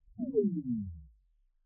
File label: Machine Charge Down; SoundsCrate